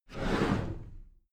cupboard slide door slide heavy

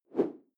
whoosh simple slash big

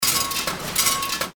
candies filling machine

